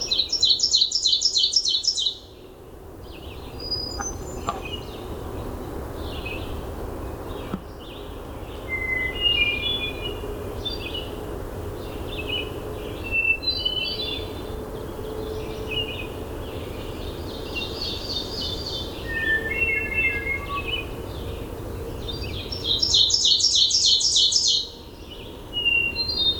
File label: Hermit thrush